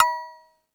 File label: Beat Box; E-MU Pro-Cussion